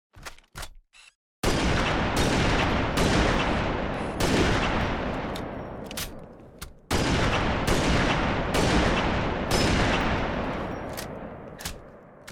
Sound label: Sniper sound effect